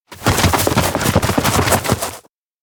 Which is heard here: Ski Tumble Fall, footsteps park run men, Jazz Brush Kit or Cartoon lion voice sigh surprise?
footsteps park run men